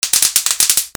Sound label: BREAKING